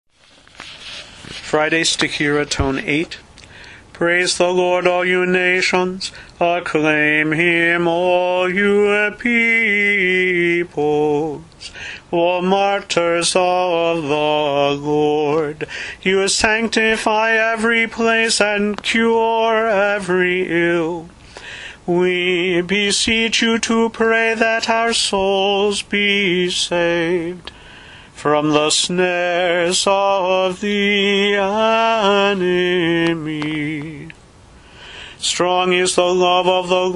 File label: Friday stichera Tone 8; Presanctified